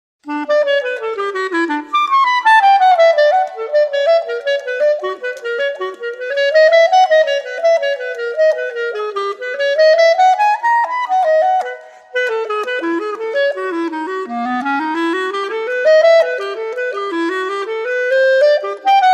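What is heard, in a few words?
shifting major scales, flute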